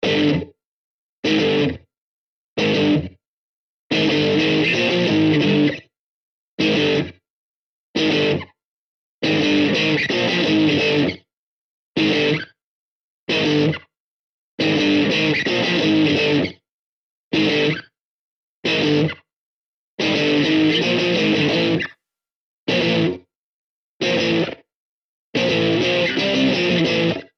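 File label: Guitar Riff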